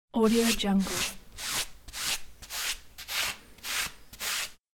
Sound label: pumice scrubbing cleaning; domestic sounds